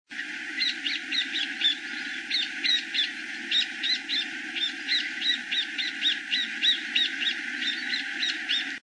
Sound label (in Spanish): voz estornino pinto